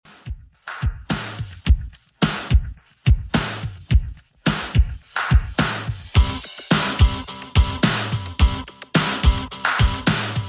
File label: 80s Redrum 107bpm